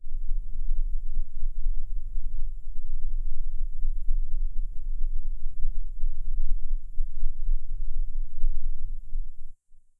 room shaking from a low, vibration

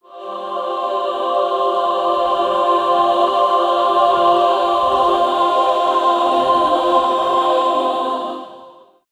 digitally distorted angel, angelic

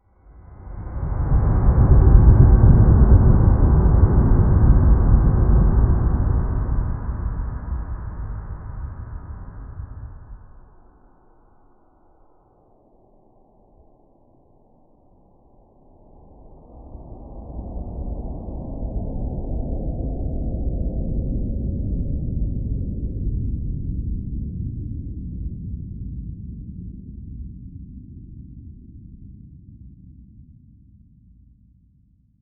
music gradual crescendo

